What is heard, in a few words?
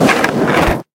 Skate Turn; Talking Tom Gold Run